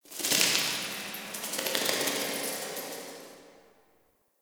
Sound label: Cave Mults Bats; Ambience; Warframe; Stalker